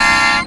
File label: submarine alarm; pyledriver